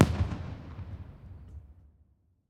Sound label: Fireworks